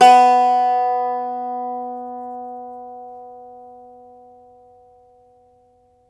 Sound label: IRISH, Old World Instruments; E-MU Producer Series Vol. 7 – Old World Instruments; World Combos; Ireland